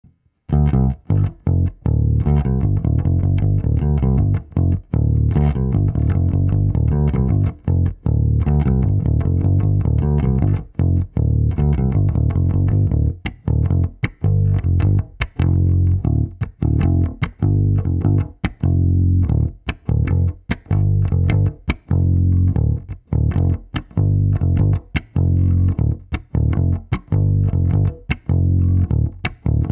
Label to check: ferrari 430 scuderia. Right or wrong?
Wrong